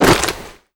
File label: foley gear land